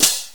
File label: Hand Cym; XG; Drums; Closed H